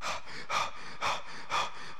breaths; Drum loops

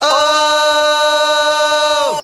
"oh", group of men; ooh ahh

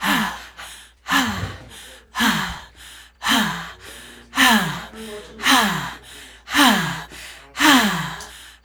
ah ah ah ah; Voice; ooh ahh

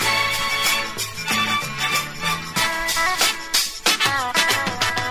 strings; Phonky

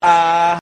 "ah", Voice; ooh ahh

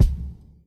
drum fill; Kicks

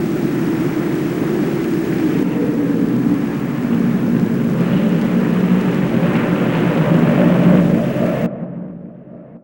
thunder

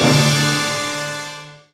orchestra, stabs

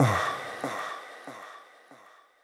"ah"

